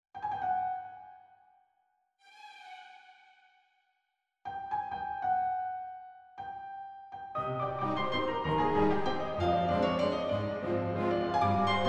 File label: Waltz